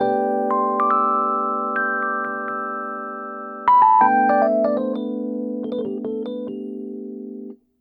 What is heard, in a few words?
Chorus, jazz; джазовый; keys